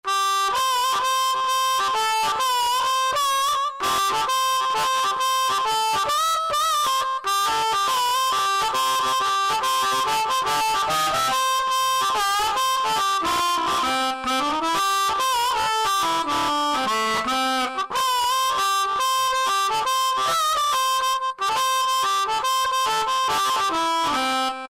Blues Harp, Korg N364; Factory Combi demos

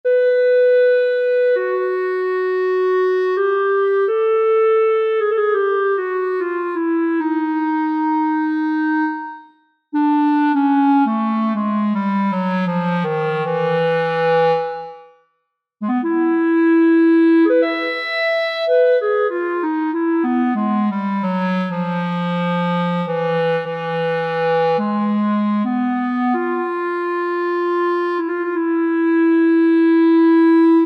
Clarinet; Guess the synthesizer game

